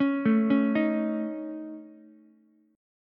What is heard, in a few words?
C sharp Suspended 2nd Sharp 5th C sharp Ukulele; C#sus2#5; arpeggiated; JGuitar